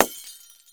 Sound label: light bulb break, incandescent light bulb break